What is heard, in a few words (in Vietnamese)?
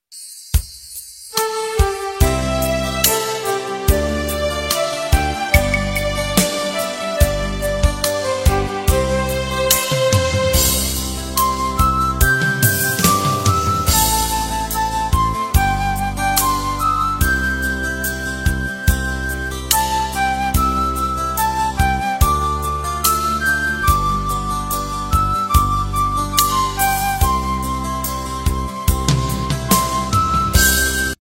beat nhac mam non nhac thieu nhi nhac karaoke nhac khong loi